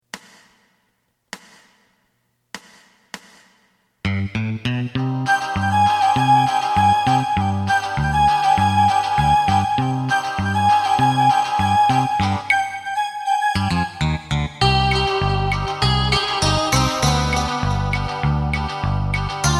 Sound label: drum